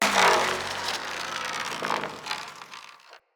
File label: Bird Repulsor Destroy Chunk Energy Fizzle; Objects; Thawtide